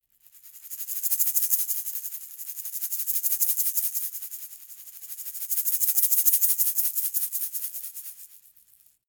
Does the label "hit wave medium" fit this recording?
No